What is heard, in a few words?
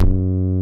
SPIKE BASS; Masterbits; Dance Trax